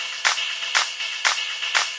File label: claps on eigths ridebacks, 120 bpm